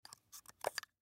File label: Rifle Round, Rifle Round Place In Holder; Gun Handling; Guns; Warfare; Blastwave